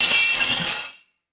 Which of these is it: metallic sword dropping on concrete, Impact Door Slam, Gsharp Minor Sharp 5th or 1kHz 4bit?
metallic sword dropping on concrete